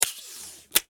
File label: Office Scotch Tape Dispenser